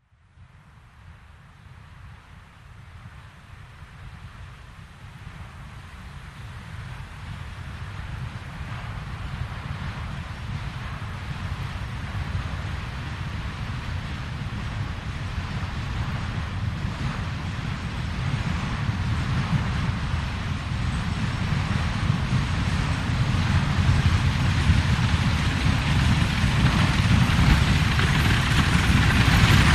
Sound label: Carriage 4 Wagons